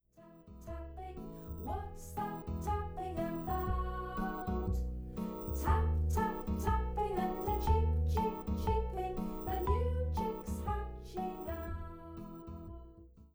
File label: Singalong Tap Tap Tapping